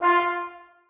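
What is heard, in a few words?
trombones staccato e4; Sonatina Symphonic Orchestra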